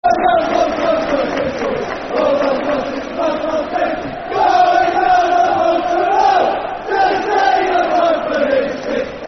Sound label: Football Chants (Soccer Songs); Blackburn Aces; Blackburn Rovers Brfc